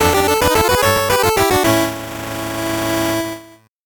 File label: 8bit jingle fast